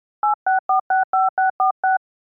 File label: telephone call